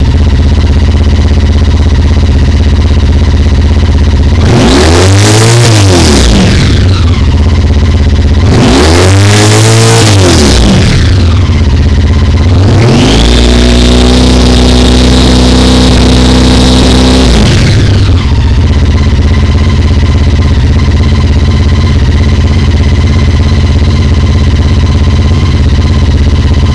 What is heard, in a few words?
ST1300 Exhaust with Restrictor; Staintunes